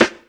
SD, Dance Mega Drums; Best Service; SD-HIP

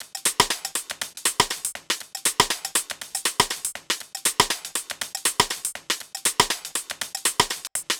Loop 120, Perc Loops; Equipped Music; Loungin House

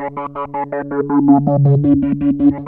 3100 DP C#4, Korg PS3100; Back In Time Records; Korg Universe VOL-1

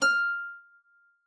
E6, HARP; Xsample VOL-8; HARP P+MF

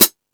High Hat, Drum Machines

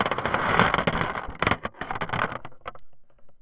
Corrigated Iron, contact mic, Marbles; musicradar; contact mic madness; Raw

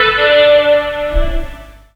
SAX FX; Ragga & Dub Reggae; BRASS KIT; AKAI; USB Soundscan vol.27

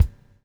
BUBL; Spectrasonics - Backbeat

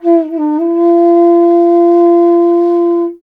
INDIA BASS, IND BASS; Spectrasonics; Heart of Asia; IND BASS PH

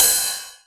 909 Ride; Novation Drumstation

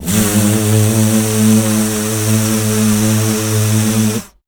WEIRDOS, Voice Spectral

